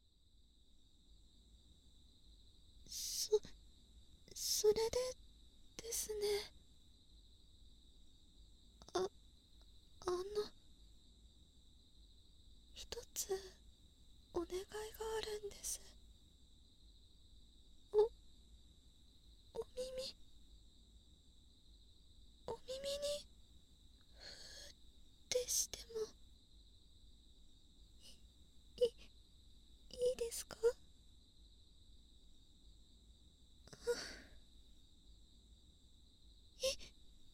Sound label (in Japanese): 耳吹き, ASMR; お隣さん秋